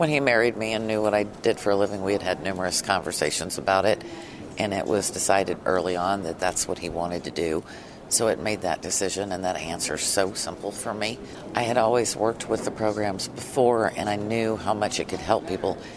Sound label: ORGAN